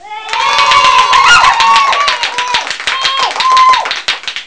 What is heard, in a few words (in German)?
beifall; lemmings; Amiga Soundtracker Sample Packs